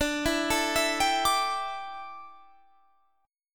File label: D Suspended 2nd Suspended 4th D, down, Guitar Standard; arpeggiated